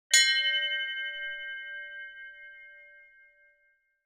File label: Handbell single ring